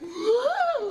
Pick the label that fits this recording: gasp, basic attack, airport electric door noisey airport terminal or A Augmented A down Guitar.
gasp